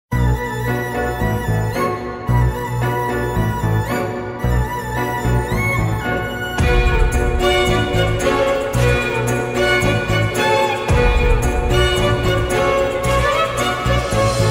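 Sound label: movie ringtone background music ringtone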